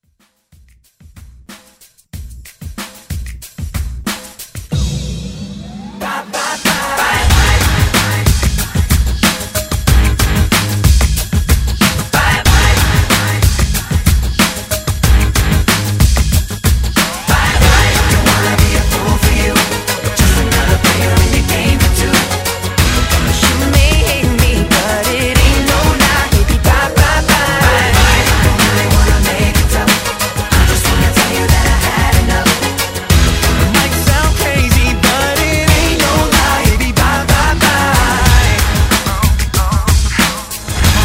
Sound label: Throwback Pop Quick Redrum